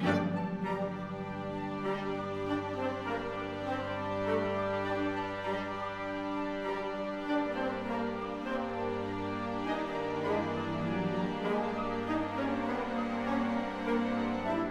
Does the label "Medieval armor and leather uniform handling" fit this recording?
No